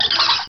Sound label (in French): chauve souris; mondeduvivant